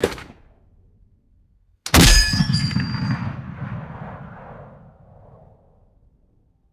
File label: deep metallic gun recoil decay; guns